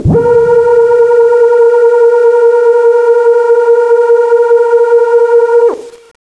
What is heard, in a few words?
laser loop, kraken; CSO KrakenBoss